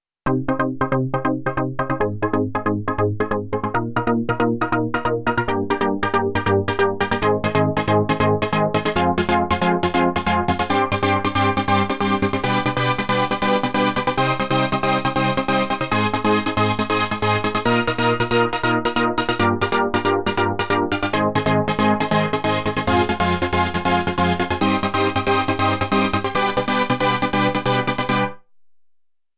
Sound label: synth dry